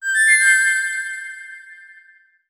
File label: Text Mess; Sony Ericsson; PlusFourSix; Venus (Xperia X1); X1 Family 2